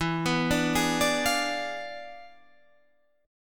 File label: E 7th Flat 9th